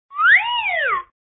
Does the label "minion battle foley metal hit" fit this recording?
No